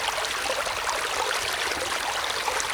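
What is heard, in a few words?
ambience stream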